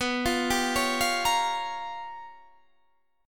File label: G 7th Sharp 9th Flat 5th